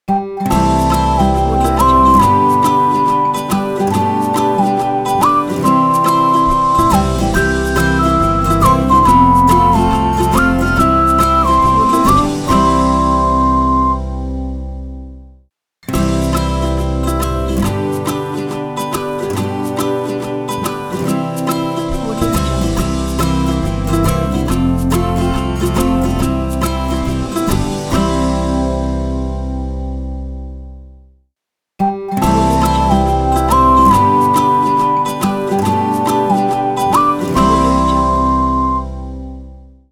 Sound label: happy birthday logo